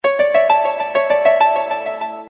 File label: best notification